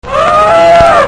Elephant; Animals

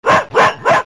Dog

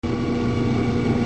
Metal Lathe; Machines

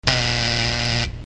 Door Buzzer; Doors

